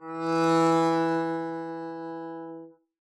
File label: arco e3 mf down, double bass; dsmolken